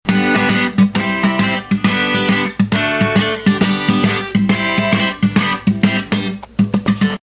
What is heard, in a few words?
Loops - Guitar Chords; Scratch